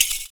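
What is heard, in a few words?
PERC, percussions; Univers Sons - Raricussions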